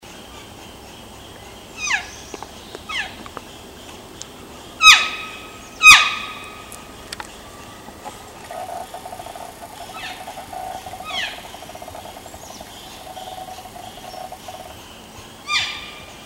calf seeking at 10 yds, elk

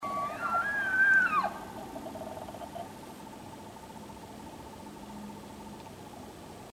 bugle curious, elk; Short Bugle

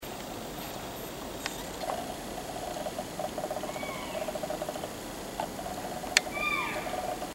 cow mew, elk; Cow Mew1; Cows

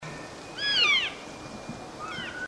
cow mew, elk; Cow Mew7; Cows

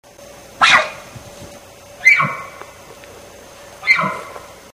bull barks, elk; nervous grunt; Here's an audio of an elk doing it. When an elk makes this sound it is asking for a visual.; Paul Medel (ElkNut) calls a "nervous grunt" that many hunters confuse as the warning bark

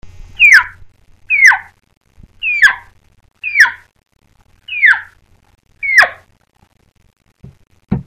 calf chirp mouth, elk

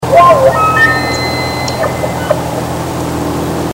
bark bugle, elk

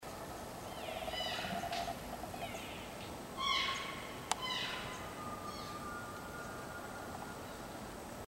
cow mew loud, elk

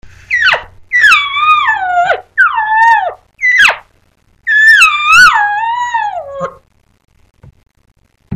estrus mouth, elk

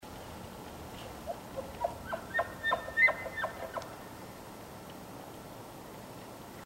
chuckel, elk; Chuckles; bull elk's throat

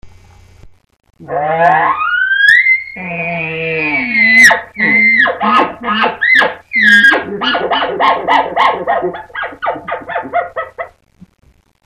challenge, elk

